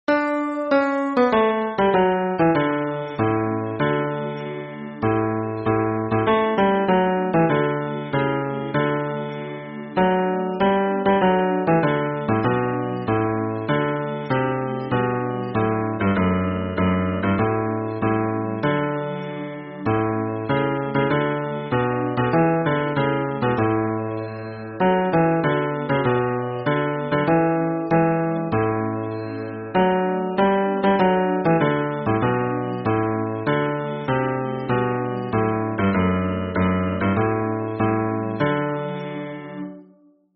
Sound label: hymn bass